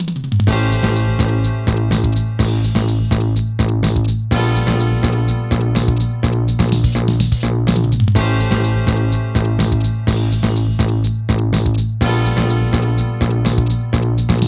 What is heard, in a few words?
arcade opening; super hang on